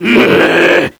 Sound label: fast zombie attack